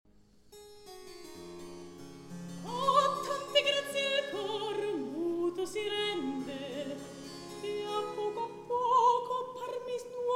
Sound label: Recitativo; A Tante Grazie Il Cor Muto Sirende; Polifemo